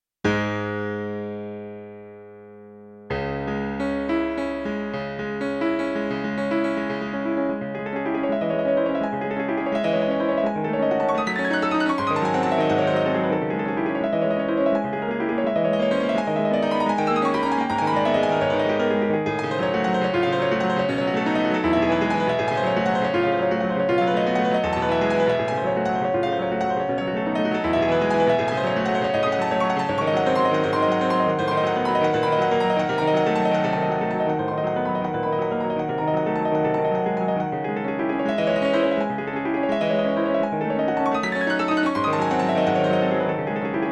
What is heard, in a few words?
SonicCell GM, piano